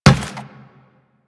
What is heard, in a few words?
Run Metal, Footsteps; Warframe; Teshin